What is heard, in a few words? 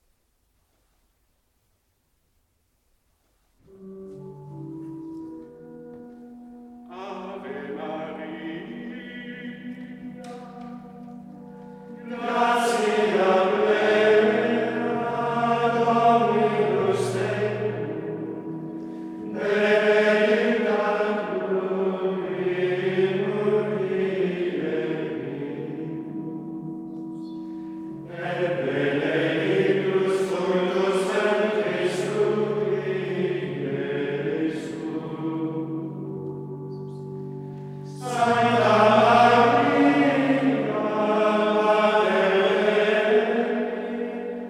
gregorian chant ave maria antiphona; ave maria antiphona in honorem beatae mariae virginis; Marian hymns